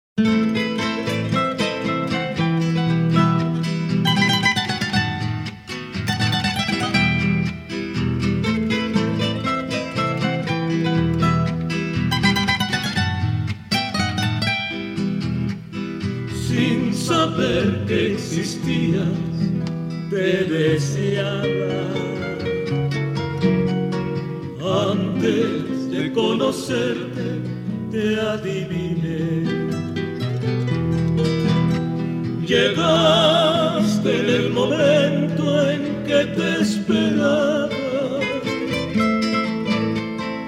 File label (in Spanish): trio romantico